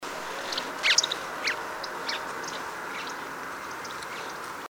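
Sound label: house sparrow domesticus flight flight call; oelzschau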